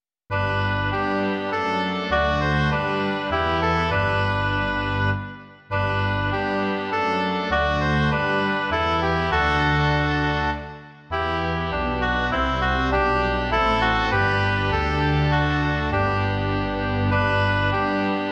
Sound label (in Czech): dva hoboje a smyčcový orchestr; Přišli jsme, ó Ježíši; Imaginativní verze